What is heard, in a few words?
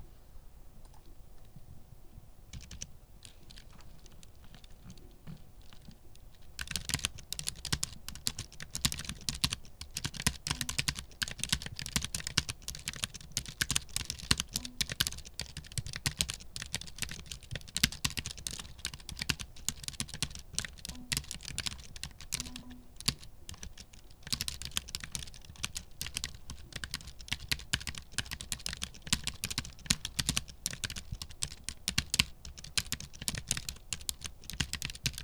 skb-sl06w, keyboard